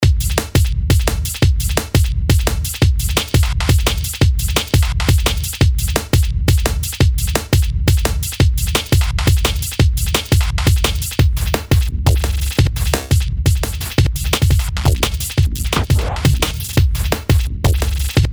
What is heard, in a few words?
Bed - Remote Chalk; Best Of N2O Library; Beds